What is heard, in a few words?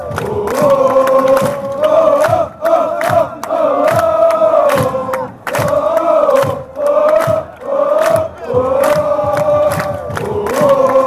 ohhh, fanchants